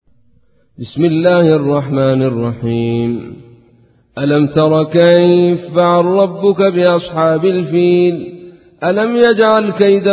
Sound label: Surah Al-Feel; Surah105